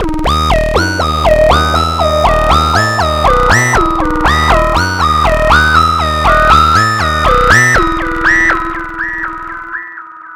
synth arp; Arpeggios; Electrocution

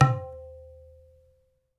Tablas; Spectrasonics; Supreme Beats; World Dance

